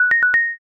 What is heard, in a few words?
washer done